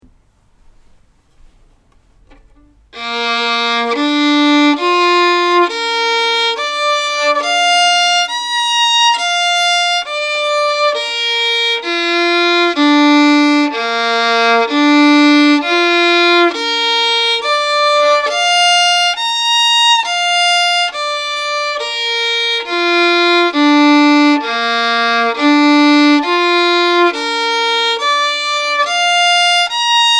Arpeggio Bb Major

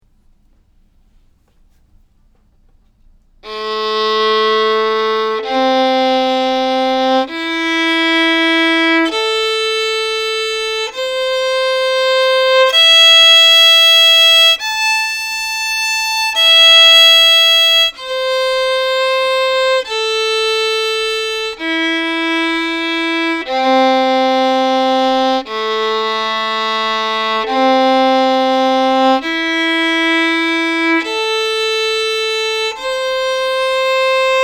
Arpeggio A minor 2 octave

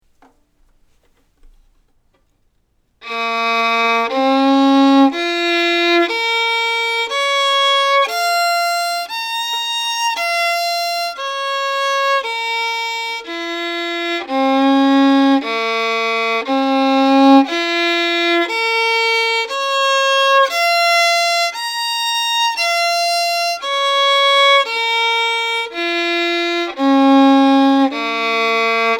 Arpeggio Bb minor

